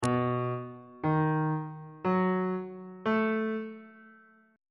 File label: f flat major 7th chord 5 is c flat dominant 7th on bass clef